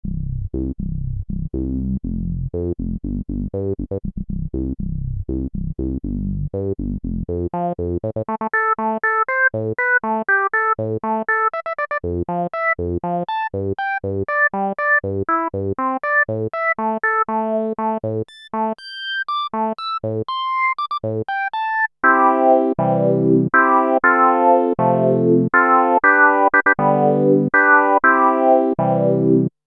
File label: filter, rez 12 pole; e-mu virtuoso 2000